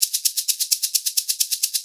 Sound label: SHAK, Percussion Loops; USB Soundscan vol.36; AKAI